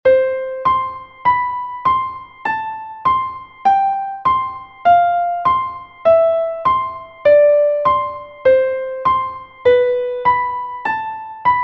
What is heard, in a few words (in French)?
PASSACAILLE